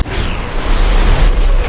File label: charge; LoL; xerath base